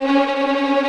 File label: VI TRM F3C L, Orchestra; AKAI Advance Orchestra Upgrade 97 Vol.1; VI TREM F